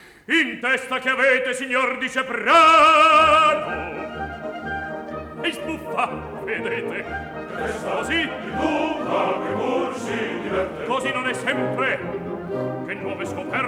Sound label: Chorus; Verdi; Rigoletto; In testa che avete; Borsa